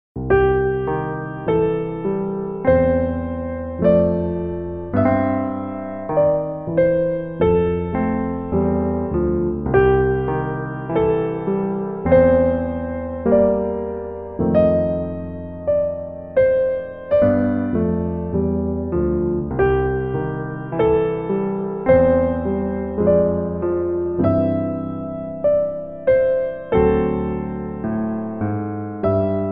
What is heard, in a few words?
Duet